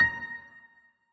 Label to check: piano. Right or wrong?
Right